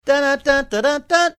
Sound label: singing first few notes; super mario overworld